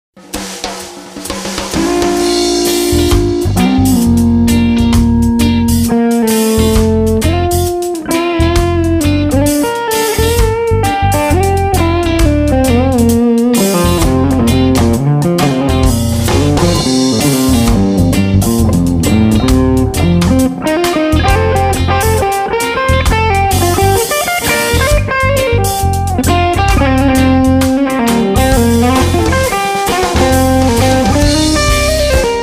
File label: Pure Gain Valve; Fuchs; Job Duo